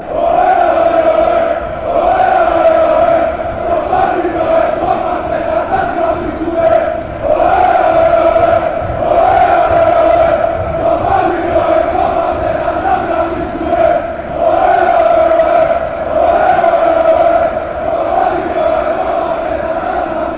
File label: chant, fanchants; panathinaikos